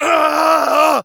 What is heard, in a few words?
pain major; Duke Nukem; Forever 2001